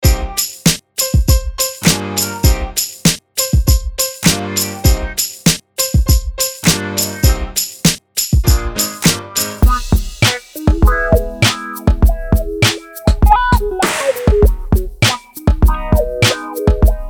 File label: Marble Rims Kit Medley